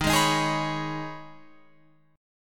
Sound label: D sharp 7th Suspended 4th Sharp 5th, Guitar, Open F; D#7sus4#5; strummed